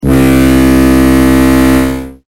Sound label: tugboat horn